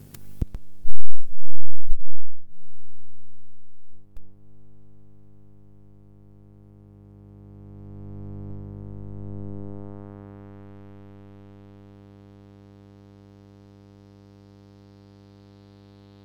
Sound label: Hum